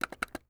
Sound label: Electronic Joystick Button Pushing; AdobeCC